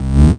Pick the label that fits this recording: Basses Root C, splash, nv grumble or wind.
Basses Root C